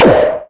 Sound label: Se Enemy Roadmechanic Summon Minions